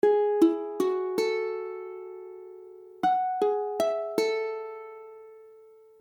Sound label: Fism, akordy ukulele